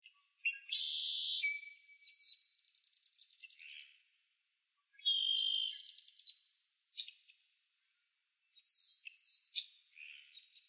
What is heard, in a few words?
Marsh Birds; Nature Scenes; Environmental